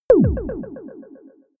synthetic hits; hits impacts

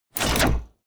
sci-fi mine place; schusswaffen

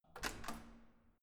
corridor door open hard

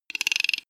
hair brush rasp plastic hollow

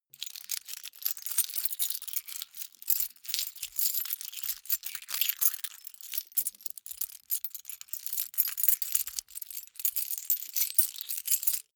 key ring large rattle sequence; objekte; schluessel

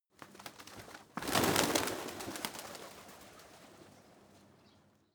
pigeons flock rise soft; natur-tiere; voegel

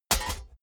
metal hit clank bright

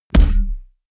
heavy saturated, hits impacts; whooshes rises

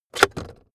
car backseat table pull out cupholder

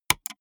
gaming mouse left click single hard; maschinen; buero

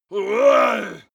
male groan strain

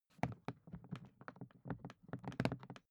briefcase plastic handle handling soft; industrie wirtschaft; bueroarbeit

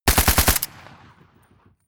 rifle ak-47 exterior fire burst close; Firearms; schusswaffen

